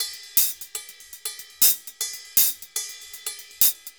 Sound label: RIDE, SAMBA; World Grooves; DRUMS; Best Service ProSamples vol.14; AKAI